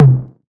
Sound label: low mid tom drum one shot c key, Royality free tom drum one shot tuned to the C note.